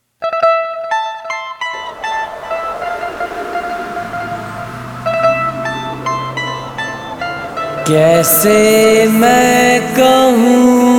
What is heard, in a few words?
Sad Version; hindi